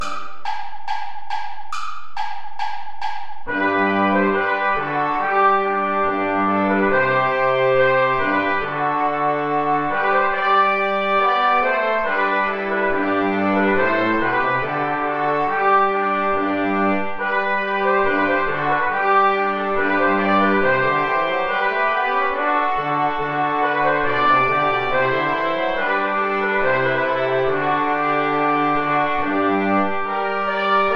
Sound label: ballet play along without trumpet; praetorius michael